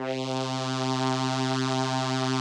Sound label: SOLINA, String Machines